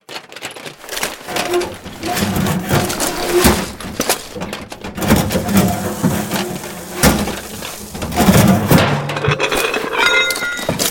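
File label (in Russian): разбросанная военная амуниция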